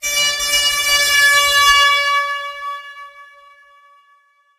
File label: horn air; source engine; tf; passtime; video games